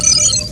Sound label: screen sound; Missions of the Reliant!